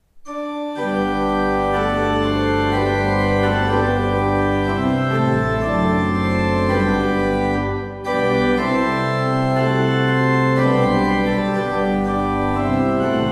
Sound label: Ott, pipeorgans